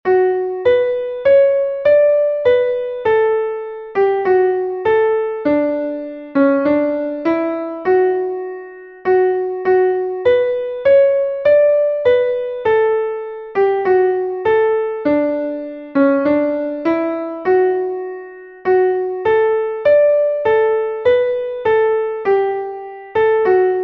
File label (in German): klavier; MelodieMeister